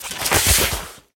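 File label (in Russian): короткий немного отдалённый звук доставания меча из ножен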